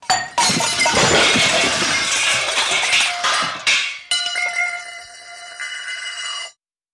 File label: Comical Crash Spin, crashes